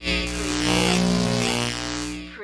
Electric Buzz Swell; lynne-publishing

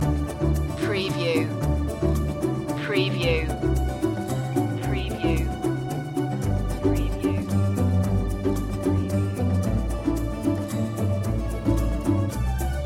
opera loop; french

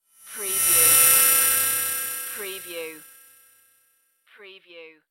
Digital Feedback; lynne-publishing

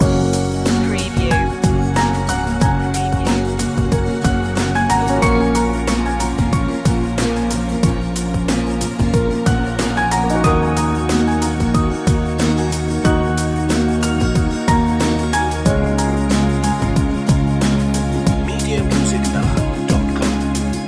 seaside walk loop

